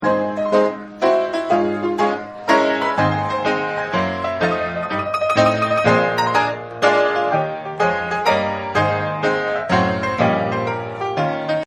Player Piano; Pianos; Musical

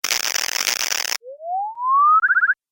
Cartoon Metal Detector; sound design; Cartoon Sound Design Sound Effects

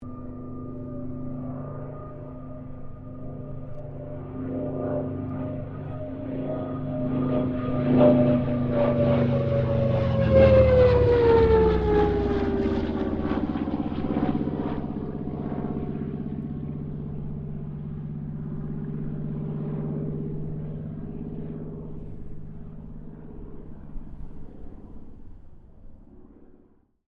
AIRCRAFT CA-13 BOOMERANG PASS STEREO

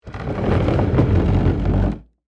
Table Slide Back

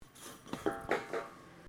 PLASTIC PIPE FALL; Material components for constructing more complex sounds; Materials

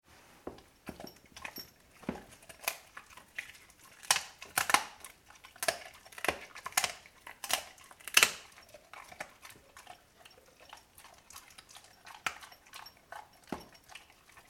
animals dog labrador eat chicken bones; Dog Eating Bones; Dogs; McKinney Sound

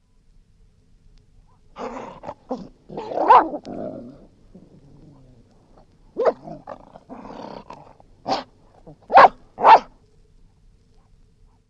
Dogs, angry snarls & barks; Animals; BBC

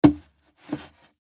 Wood Plank Grab Slide Movement Handle; Materials; Foley; Blastwave

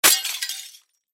Crash Glass Smash Crash Object; Door Window Pane Small; Smashes; Blastwave

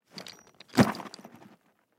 Duffle Bag Drop, Duffle Bag Drop Catch Strap; Drops; Foley; Objects; Blastwave

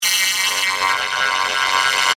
Alarm Warble, Production, Element, Alarm, Warble; Warbles; Sound Effects:Sound Design

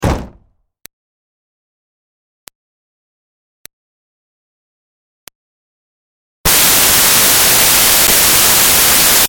Car Smart Car Door Close Slam; 2005 Smartcar Fortwo; Cars (Sound Effects:Vehicles); Big Room Sound

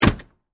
Ice Box Door Close, Hard, Antique; Household; Appliances

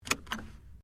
Car Toyota Corolla seatbelt unclip; 2009 Toyota Corolla hatchback; Cars (Sound Effects:Vehicles)

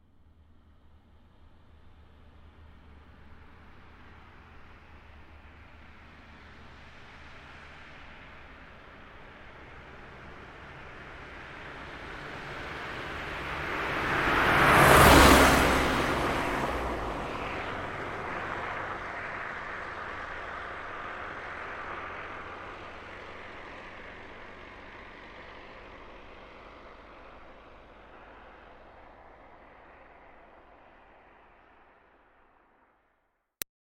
Car Smart Car By Fast; 2005 Smartcar Fortwo; BigRoomSound

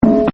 BEEP; Objects; Partners In Rhyme

